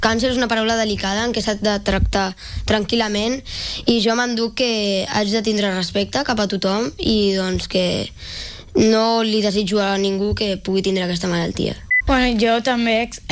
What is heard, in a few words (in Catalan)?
bateria